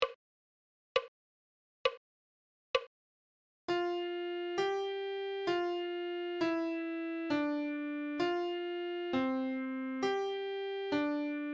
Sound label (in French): clé de sol adagio